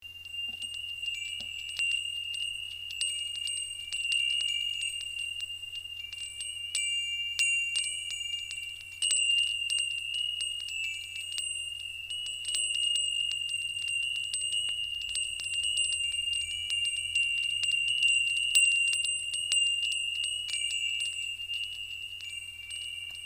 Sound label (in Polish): dzwonek, fengshui; dzwonki